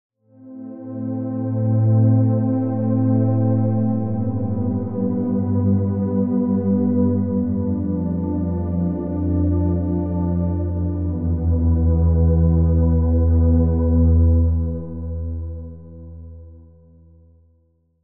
Pad; Routed Sound; Sylenth1 Expansion - Quantum Enforcer Vol.1

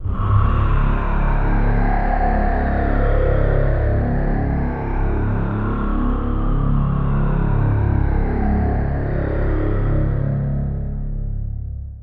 Pad; Pad - Turbo Killer; Routed Sound; Serum 2 Expansion - Doom Carpenter Vol.1

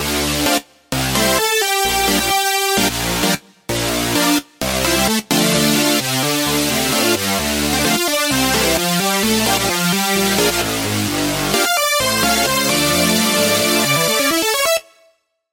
Chord - Saw; Chord - Saw Of Harmony; Routed Sound; Serum 2 Expansion - Hyper Gamer Vol.1

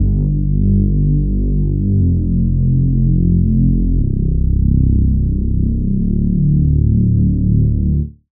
Reese; Routed Sound; Serum 2 Expansion - Street Vanquisher Vol.1

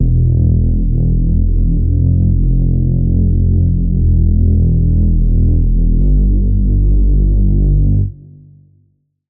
Reese; Routed Sound; Spire Expansion - Street Vanquisher Vol.1

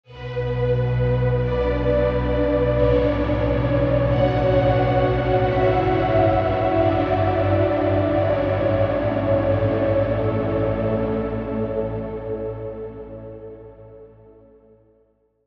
Pad; Routed Sound; Spire Expansion - Star Scavenger Vol.1

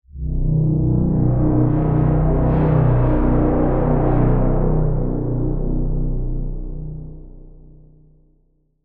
Pad Rumble Gate; Routed Sound; Sylenth1 Expansion; Chaos Bringer Vol.1

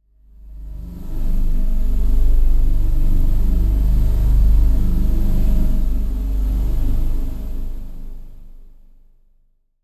Pad; Routed Sound; Sylenth1 Expansion - Chaos Bringer Vol.1

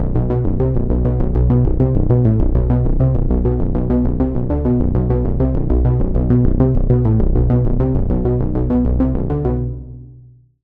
Bass; Deep Curse; Routed Sound; Serum 2 Expansion - Doom Carpenter Vol.1

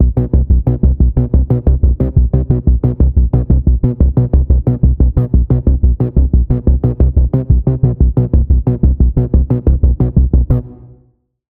Pulse - Multi Driver, Sylenth1; Routed Sound; Sylenth1 Expansion - Quantum Enforcer Vol.1

